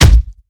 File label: Melee Impact Base; Evolve; Behemoth